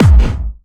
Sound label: Kick